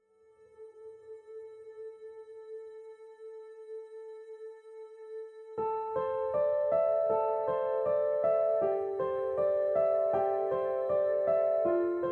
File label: Rain; Anger Music Library